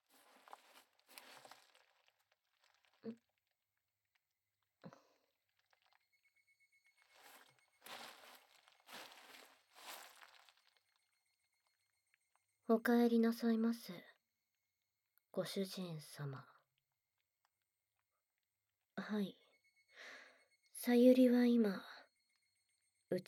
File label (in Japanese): お外でキス, ASMR; 嫌がるさゆりにお外でキス